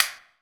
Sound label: clap stick